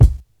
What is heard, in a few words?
natural bass drum single hit a sharp key, kickdrum sound tuned to the A# note